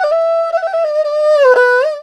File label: ERHU LICKS